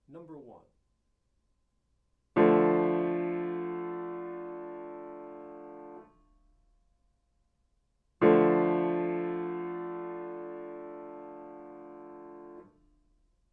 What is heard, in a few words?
chords; eartraining